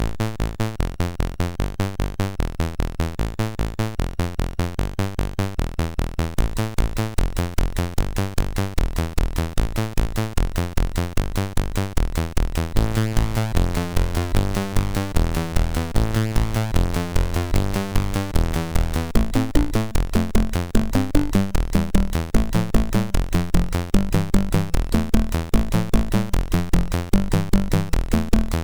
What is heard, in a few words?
Small Fast Sid Music, MOS6581R4